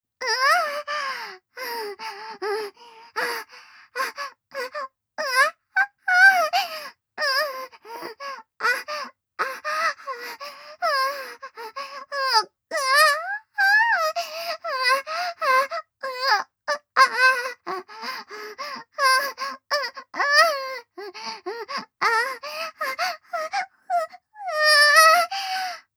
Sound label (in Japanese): 喘ぎ, ASMR